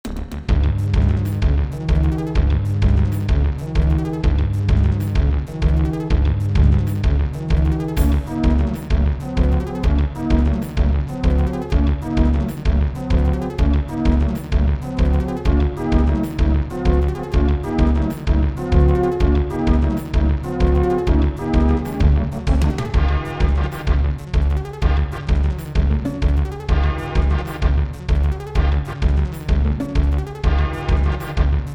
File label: Alcatraz Drone; Fade to Black